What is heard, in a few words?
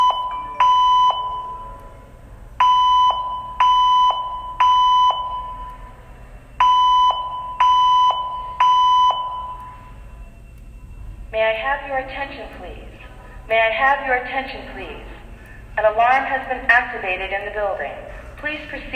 Newer Talking